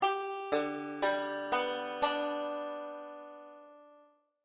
open g chord, banjo; beginning 5 string

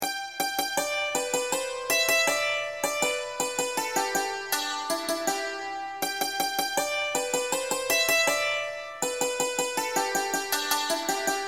melody only, banjo; 5 string; cripple creek

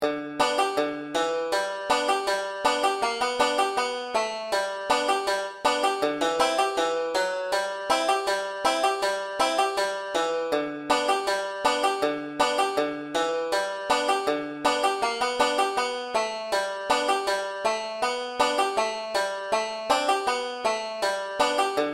this lil light banjo solo w hammer; beginning 5 string

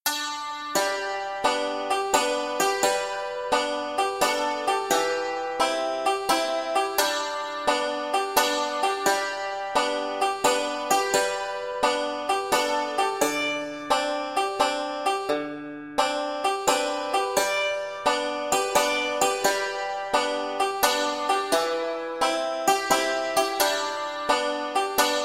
banjo 9 8 g c d; 5 string; amazing grace

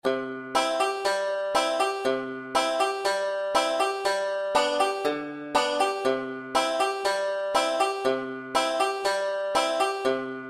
accompaniment banjo only; 5 string; aunt rhody

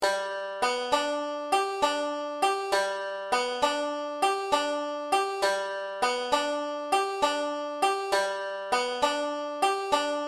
3 4 swing time, banjo; beginning 5 string

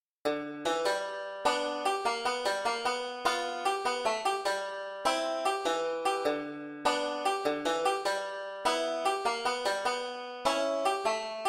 banjo solo blues licks; 5 string; amazing grace

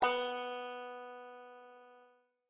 banjo b note; beginning 5 string

